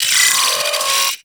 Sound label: Arachnoid Micro Vocal Death; Warframe